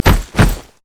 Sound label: emote dance; LoL; trundle